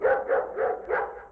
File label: dog; cstrike; zm japan